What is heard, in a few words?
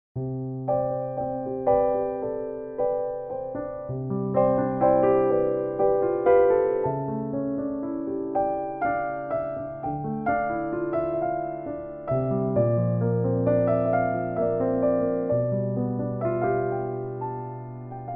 improvised